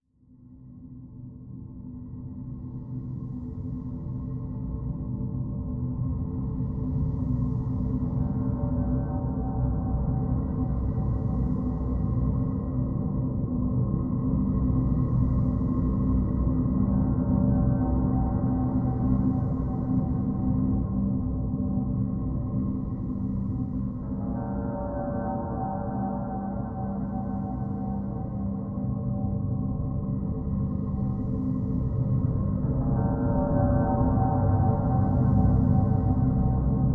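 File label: Drone Vertigo High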